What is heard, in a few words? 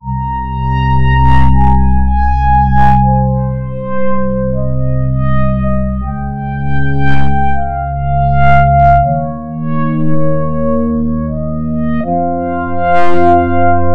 PAD Dark Ambient; SpheratorFM; syntheway